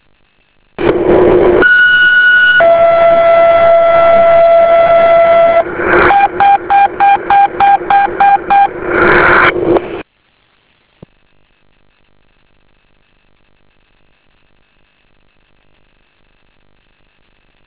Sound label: Fire Tone